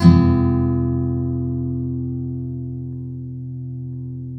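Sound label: nylon up minor F#, guitars, flam chords; Spectrasonics; Hans Zimmer Guitars VOL-2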